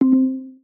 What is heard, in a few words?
begin short carplay, iOS Sounds